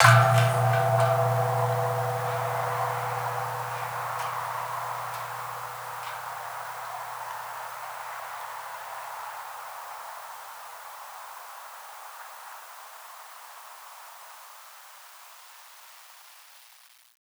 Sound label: Suwukan ring, Gamelan